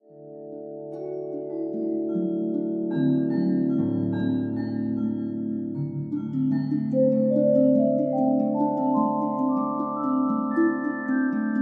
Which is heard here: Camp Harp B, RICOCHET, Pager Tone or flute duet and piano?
Camp Harp B